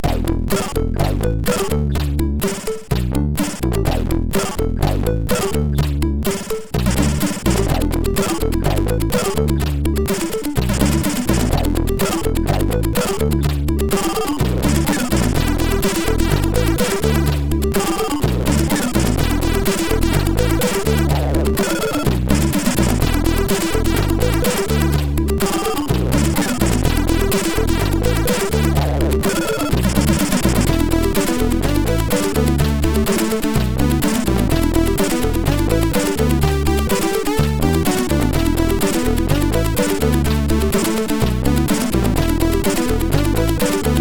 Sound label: Funky Stuff, MOS6581R4; SoedeSoft